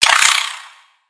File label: Spider Get Hit